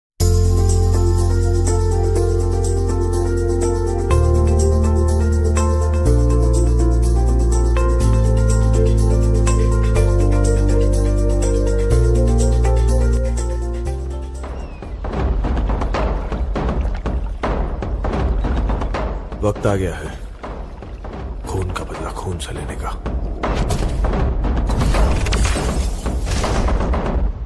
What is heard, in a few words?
Music Ringtone